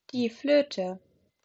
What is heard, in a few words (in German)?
Flöte